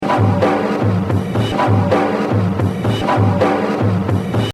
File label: Jazz Drumming; Kenny Clarke; The Evolution of Jazz Drumming (Gottlieb)